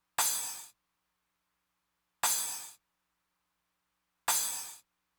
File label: Crash, Roland TR-909; Roland TR-909 Ableton Project